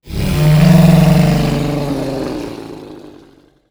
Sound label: Monster; Zenless Zone Zero